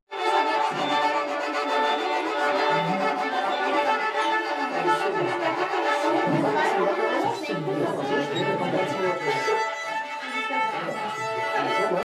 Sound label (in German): Geige